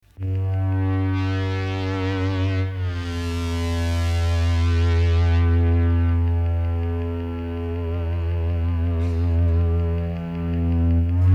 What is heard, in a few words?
Deep Synth